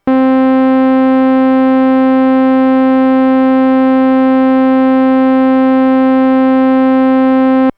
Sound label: sawtooth, MIDI Synth